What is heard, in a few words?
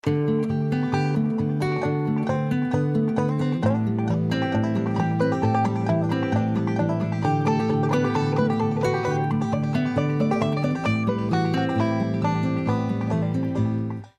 Banjo